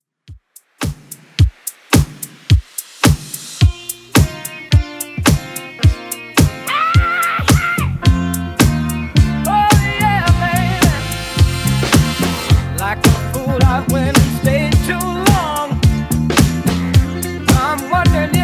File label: 70s Redrum 108bpm